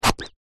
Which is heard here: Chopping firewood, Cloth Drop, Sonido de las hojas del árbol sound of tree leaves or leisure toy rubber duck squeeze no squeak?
leisure toy rubber duck squeeze no squeak